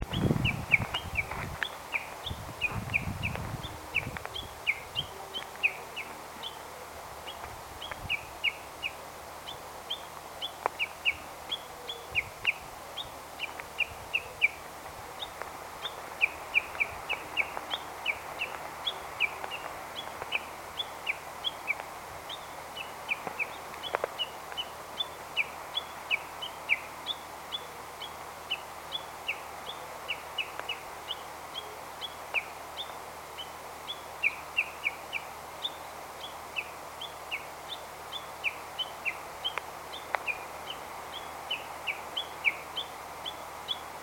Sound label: bush warbler special